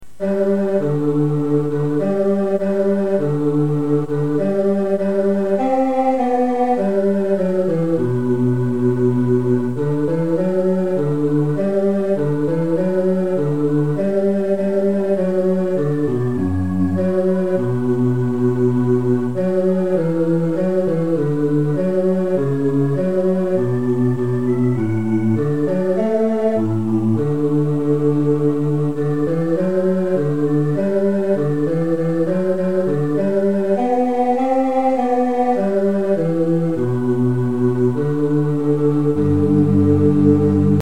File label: o little davies bass; Christmas; carol tracks